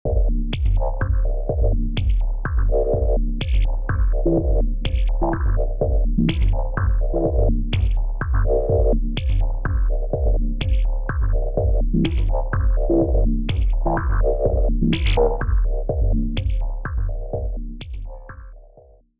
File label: Mini D6 Wet; filters bundle